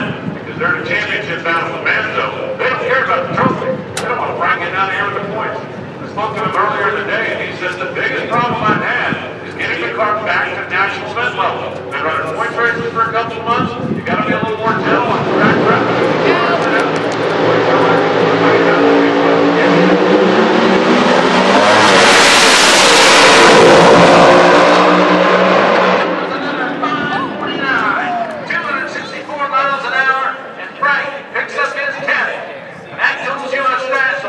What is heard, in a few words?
drag race national final feel the noise